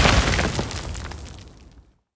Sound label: stone destroy; smite; medusa